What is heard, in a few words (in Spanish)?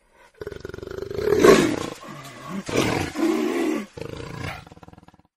Sonidos de Jaguar